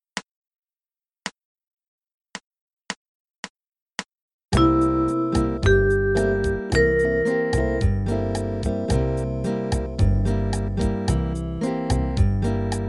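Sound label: Dom7 First Inversion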